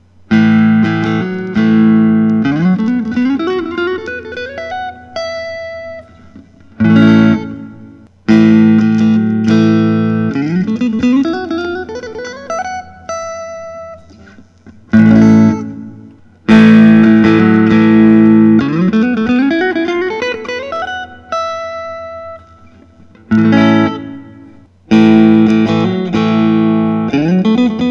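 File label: S style electric guitar; lentz pre lawsuit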